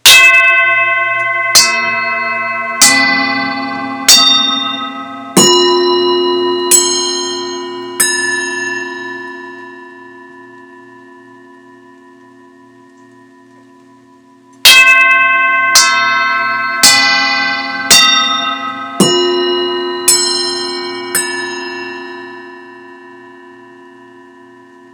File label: all bowls